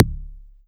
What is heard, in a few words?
Yamaha TX81Z Bass Drum